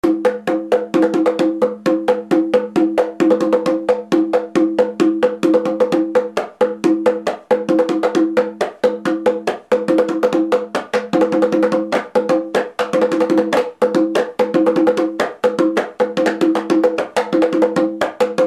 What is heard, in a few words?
bata drums; CustomDrums